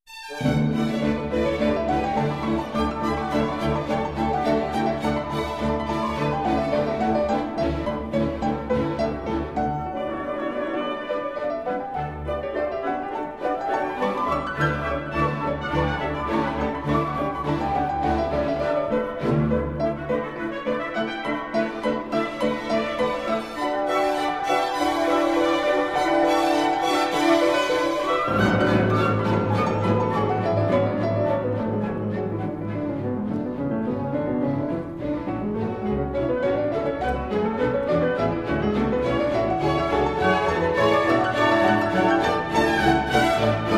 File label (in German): Klavierkonzert Allegro; GTailleferre